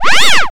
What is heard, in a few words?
se ufo alert